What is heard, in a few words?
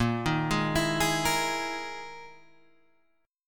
E Major 7th Flat 5th chord